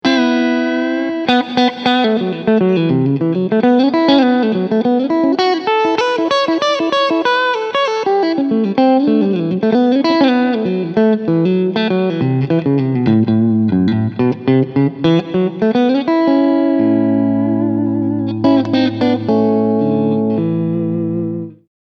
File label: Front Mitte Clean Picking